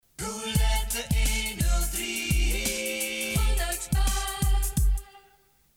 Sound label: Jingle